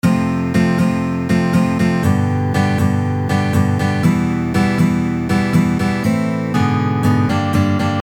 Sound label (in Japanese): マイナーの半音降下; oudo progressions 11patterns